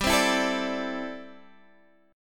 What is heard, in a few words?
A Minor 11th G, Chord